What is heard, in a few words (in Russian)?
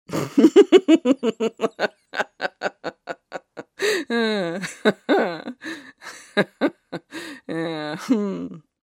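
witch laughs pretending to be an ordinary woman; Для Хэллоуина; Коварный злой смех старой ведьмы, забавный смех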